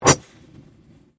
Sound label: Convolution Surge